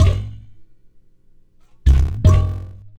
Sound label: Udu